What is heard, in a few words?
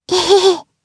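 Laugh, Japanese; voices; heroes; Lewsia A; kingsraid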